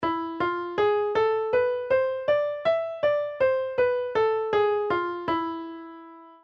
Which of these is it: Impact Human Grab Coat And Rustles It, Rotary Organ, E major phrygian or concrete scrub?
E major phrygian